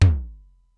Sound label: Simmons SDS-9 drum machine; Factory C1